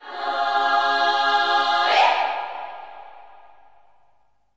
Slavic Choir C sharp Aah Eek, Slavic Maybe Bulgarian Choir